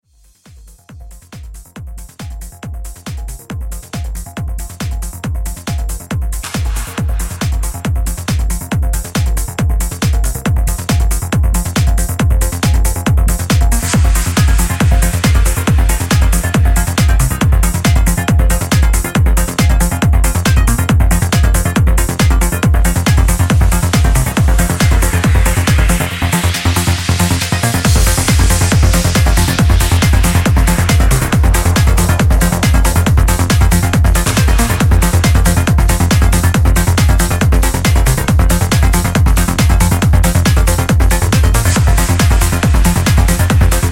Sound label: Soundscape Mix